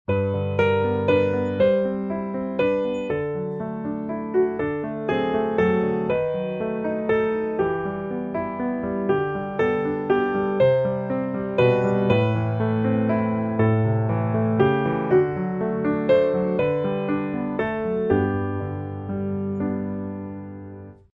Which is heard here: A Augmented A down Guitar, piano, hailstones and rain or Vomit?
piano